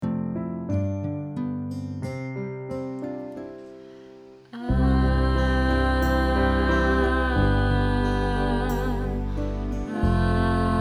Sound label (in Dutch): Ken geen angst onderste stem